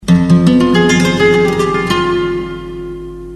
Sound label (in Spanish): guitar